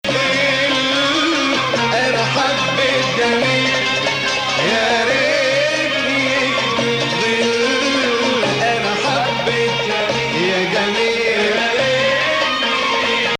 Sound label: Nahawand